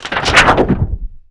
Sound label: snap, percussive